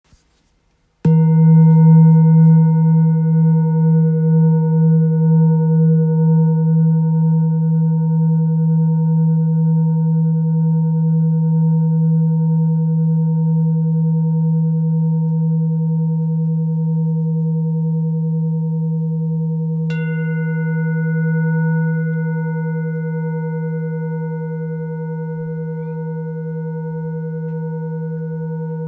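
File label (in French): Bol thick mi, bols chantants tibétains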